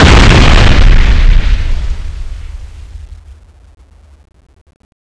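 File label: canon fire ball explosion; weapons; cstrike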